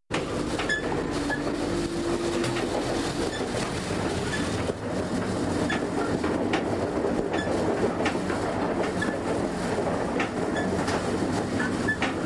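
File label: noise between the cars of a moving train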